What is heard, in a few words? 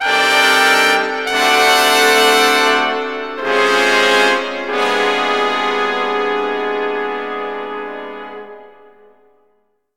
Lose (Death); mobile suit gundam gundam vs. zeta gundam